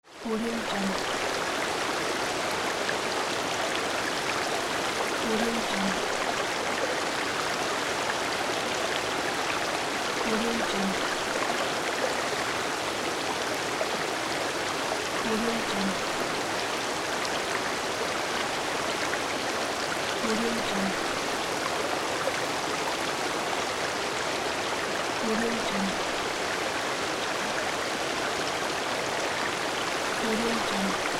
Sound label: water